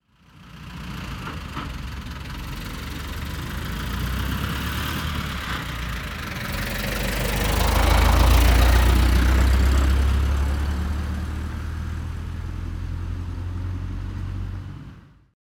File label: tractor driving